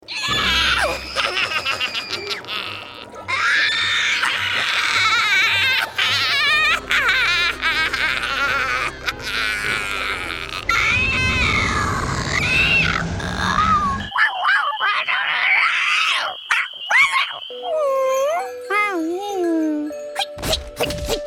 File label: Creature